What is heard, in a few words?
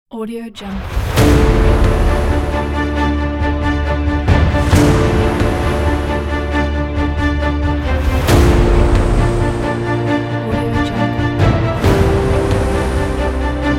epic pack